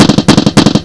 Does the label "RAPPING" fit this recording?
Yes